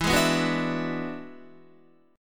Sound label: E 9th E down Guitar; E9 strummed; JGuitar